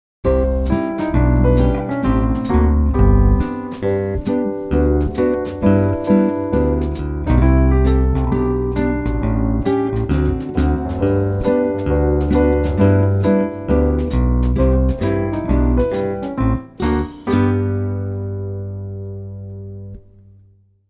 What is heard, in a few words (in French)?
basson